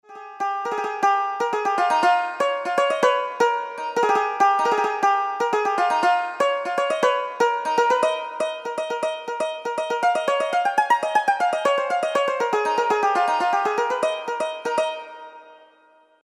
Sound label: tenor banjo